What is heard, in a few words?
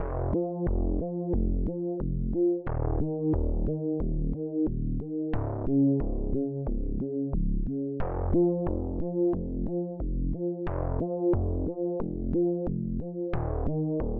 bass